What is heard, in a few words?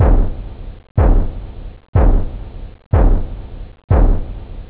hard, drums techno; OctaMED SoundStudio V1